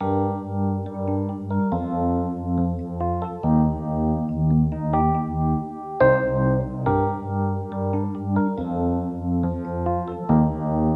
night beats

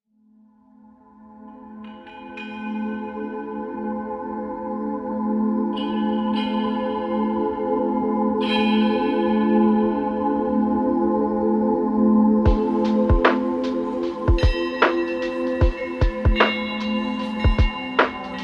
oriental soundscapes

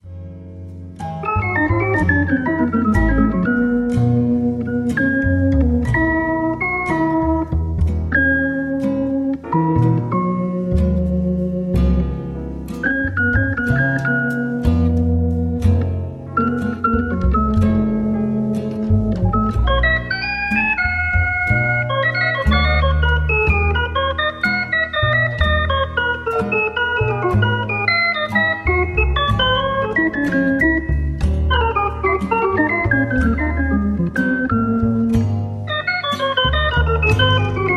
jazzy improvisations

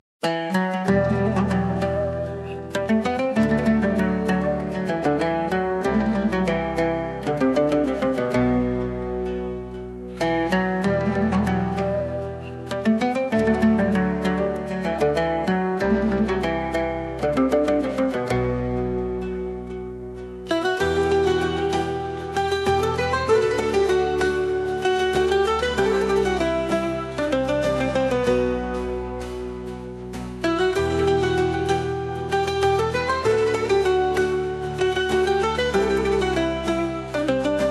Asian Rhythms

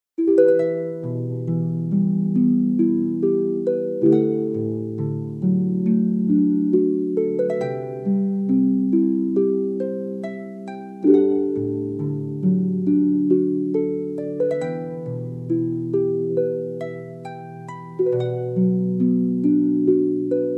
celtic harp

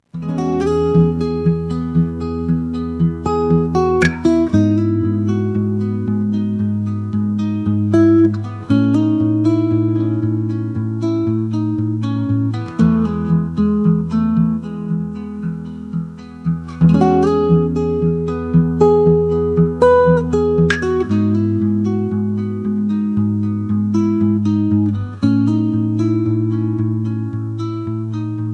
guitar

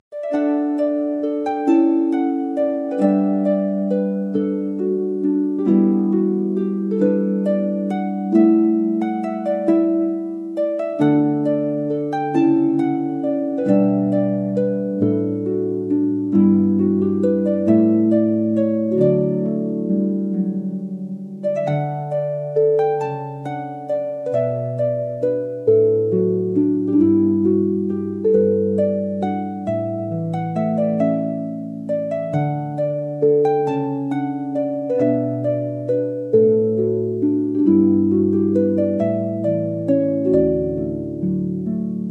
celtic harp

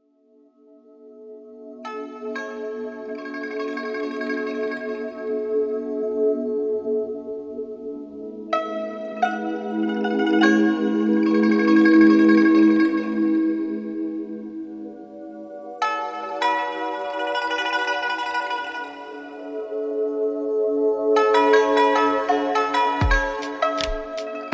oriental soundscapes

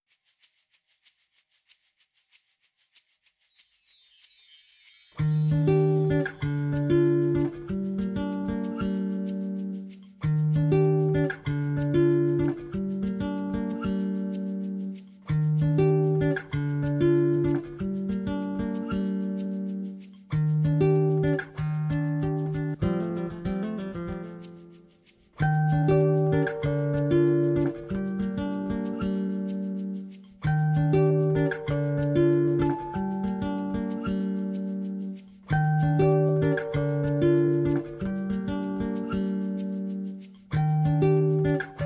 swing jazz Bossa 432 hz

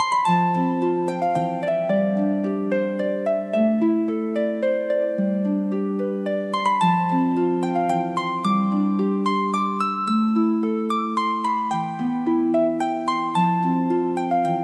celtic harp

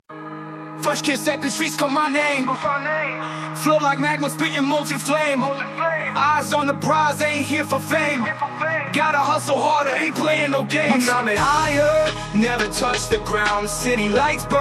hip hop vocals

